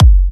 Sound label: Electro Kicks